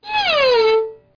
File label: viol; Microsoft Graphics Studio Greetings; Microsoft 1998